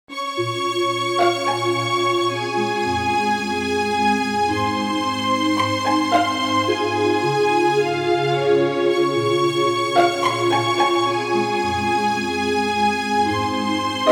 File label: Background music for furniture company commercial